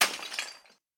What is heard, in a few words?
impact tile debris breaking; sound effects one